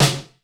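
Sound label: dry snare, dry kits; Crypton; DrumBase5000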